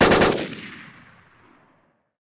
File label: machine gun; Original TK Sounds